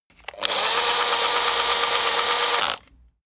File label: servo rattle on off